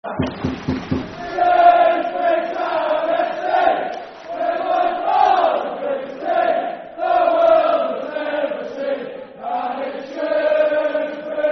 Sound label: Football Chant, Soccer Song; Shrewsbury Town Fc; Shrewsbury Town Stfc